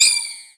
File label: Attack Magic; Magic noise from Zelda's basic attack